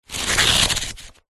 household book old thick thin pages well read pages flick through; zapsplat